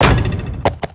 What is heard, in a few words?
goat action